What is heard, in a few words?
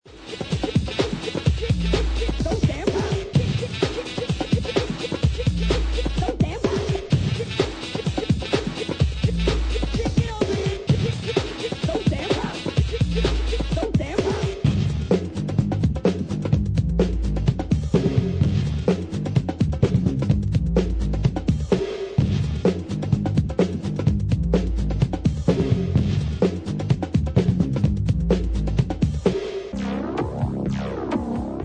instrumental mix